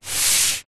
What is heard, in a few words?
household book slide table